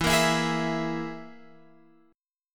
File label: E Major Flat 5th